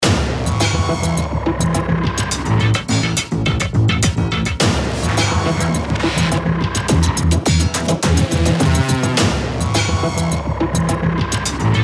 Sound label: Synthetic Loop BPM105